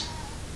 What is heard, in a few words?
hmmmm